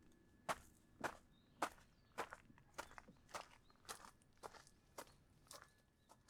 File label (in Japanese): 砂利道 歩く長め; 砂利道を歩く; 往復; 動き